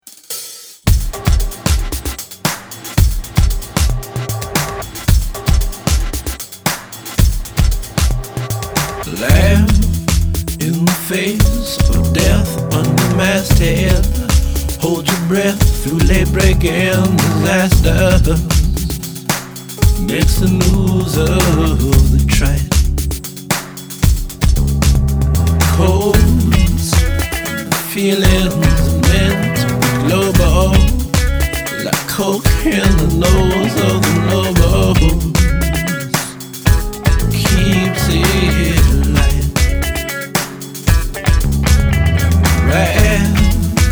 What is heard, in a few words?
Crying; One Train May Hide Another